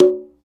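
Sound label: Conga Lo Mute; Roland BK-5; Percussion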